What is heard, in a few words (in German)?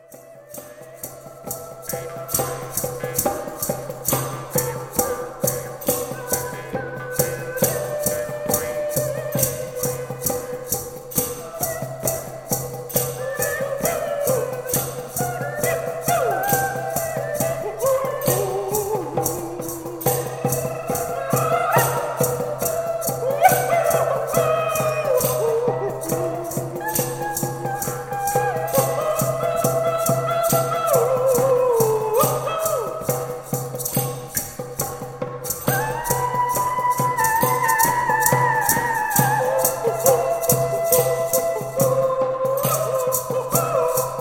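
Freie Improvisation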